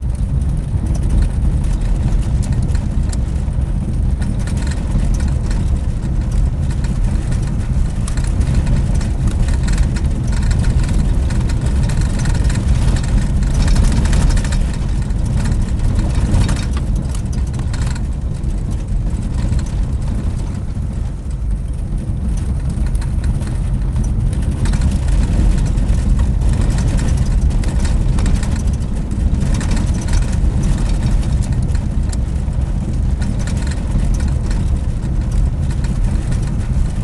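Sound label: cadillac 1960 interior drive gravel road wide; fvtt sonniss gdc audio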